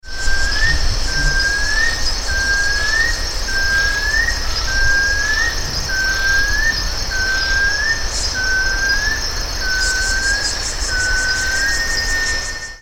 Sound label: Amaurolimnas concolor; EE Jureia Barreirinho Peruibe SP Brazil; Silveira